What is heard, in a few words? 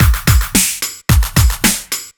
Beat 110bpm; musicradar